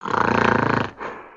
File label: idle, creatures organic